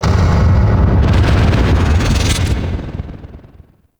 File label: Distant Mechanics; Ambience; Warframe; Corpus Railjack